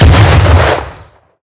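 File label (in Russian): шумный взрыв метеорита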